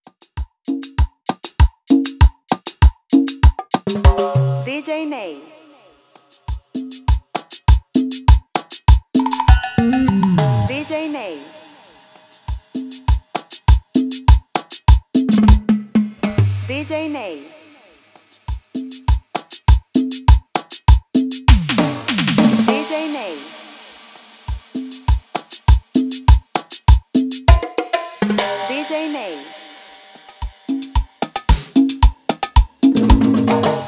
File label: FILL CUMBIA; NEEY